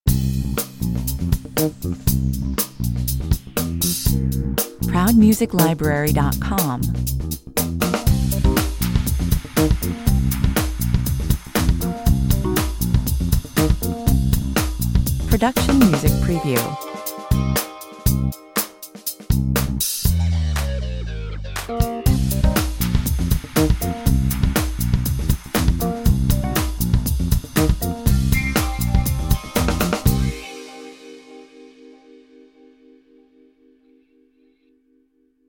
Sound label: funkwah 120 bpm